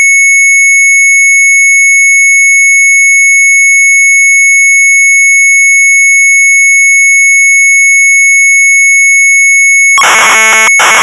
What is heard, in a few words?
Sharp MZ800 Tape; EDUCATIEF 700-04; retromelsarchive